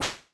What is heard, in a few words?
hit; Incantation